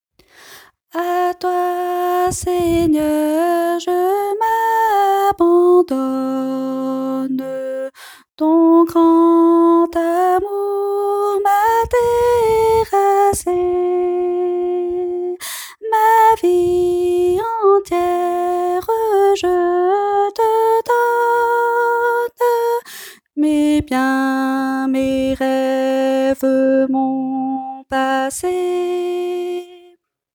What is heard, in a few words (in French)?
basse; 164 – A toi, Seigneur, je m’abandonne.